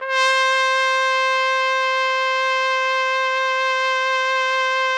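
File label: trumpet; Roland - LA Composer vol. 3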